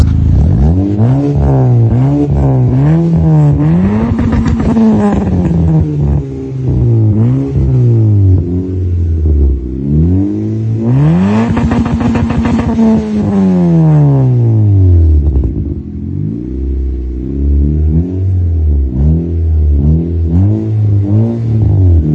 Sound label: jumper exhaust